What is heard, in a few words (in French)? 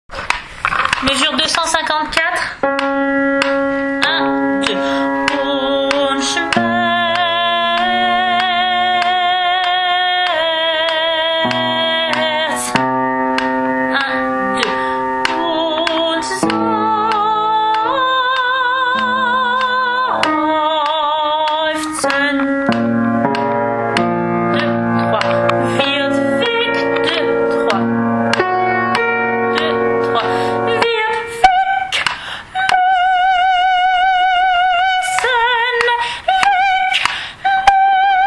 Brahms requiem allemand soprano mesure 254